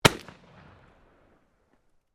PISTOL BLACK POWDER ARMY 357CAL FIRING, Black Powder firearms at a shooting range